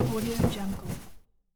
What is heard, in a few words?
large styrofoam block rustle